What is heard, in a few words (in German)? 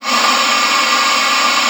LUFT, OB D.AMORE; Xsample VOL-7